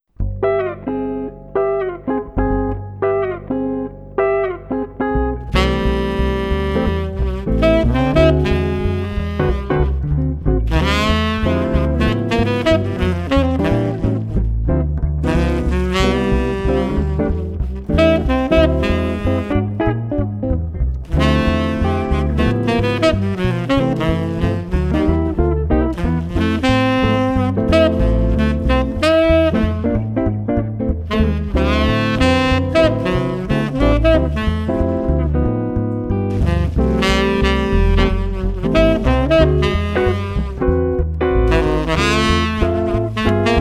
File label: trio